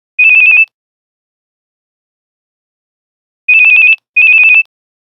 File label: Nokia Buzzer Bee; Windows Phone apps